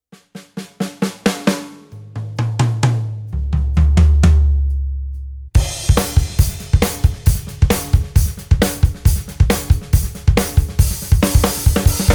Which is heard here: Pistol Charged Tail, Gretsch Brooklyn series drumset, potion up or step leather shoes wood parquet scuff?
Gretsch Brooklyn series drumset